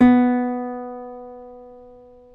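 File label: B2 classic, classical guitar; Northstar - New Gold VOL-2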